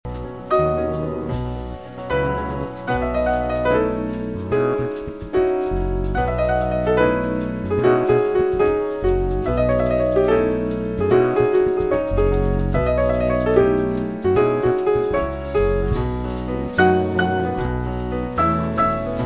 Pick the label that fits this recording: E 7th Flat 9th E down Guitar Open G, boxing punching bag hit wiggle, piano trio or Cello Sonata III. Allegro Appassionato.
piano trio